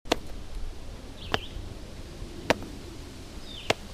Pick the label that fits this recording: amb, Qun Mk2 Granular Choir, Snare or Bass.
Snare